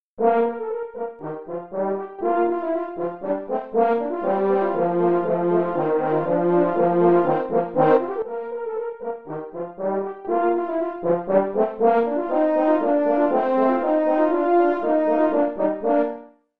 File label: Russian Dance, Duets, French Horn; qn=120; Easy Classic Duets 27; Nutcracker